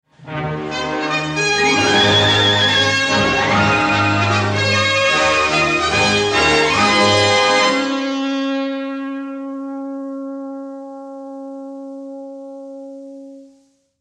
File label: Fanfare; Indiana Jones and the Last Crusade; Indy